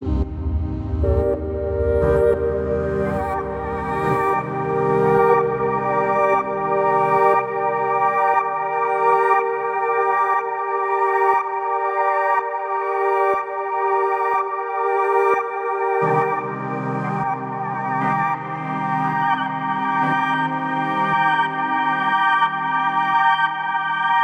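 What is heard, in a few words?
sustaining a C minor chord in two octaves; vocal samples; Void & Vista; Kontakt; Highlights/Perspective Shift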